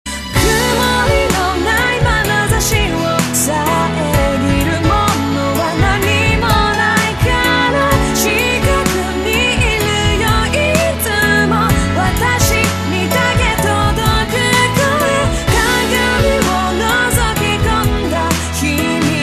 mirror acoustic ver

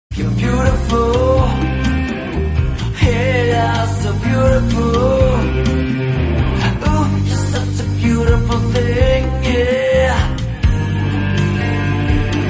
samba

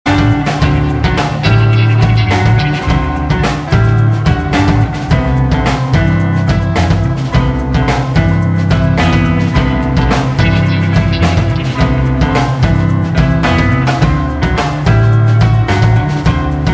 surf beat

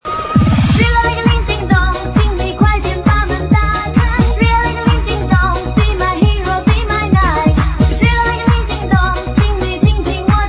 ding dong

